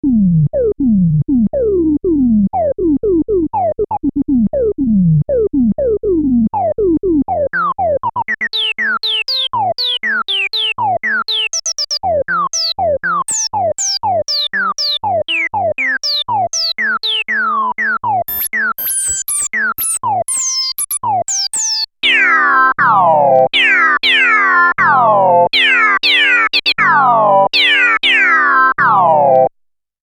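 novation nova desktop filter resonant low pass filter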